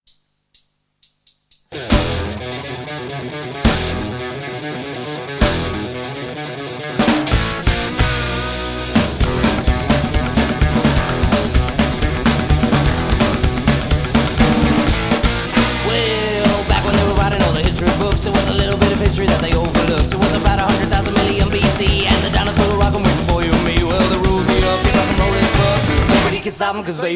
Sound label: Dinosaur Rock